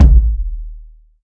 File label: large room bass drum one shot a key, Royality free kick one shot tuned to the A note; kick drum samples